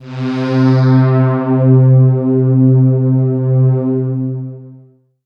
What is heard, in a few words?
pad, Dance Synths